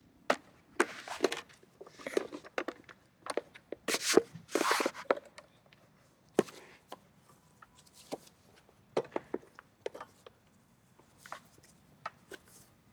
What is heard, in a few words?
Cricket - Junior & Senior; TheWorkRoom Audio Post